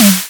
Drums Classic Drum Synth Snare

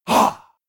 Hah Many Voices

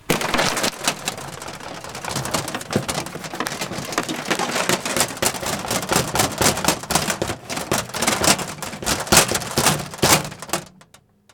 Sounds For Earthquakes Plastic

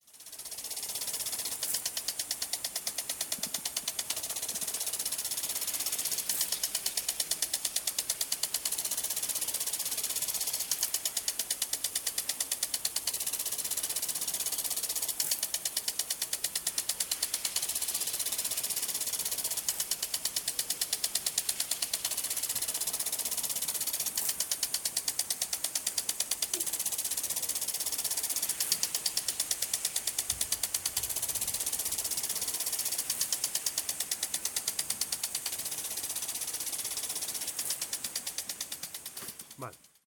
Sprinkler Aspersor

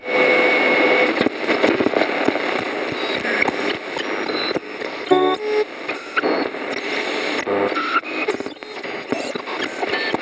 Radio Tuning Am Fast

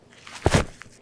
Jumping On Grass

